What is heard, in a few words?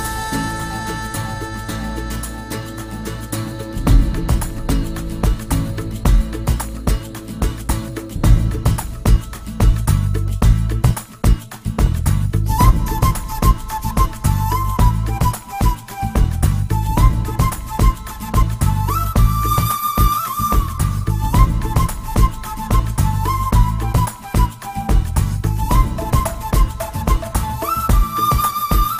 soothing beats; reggae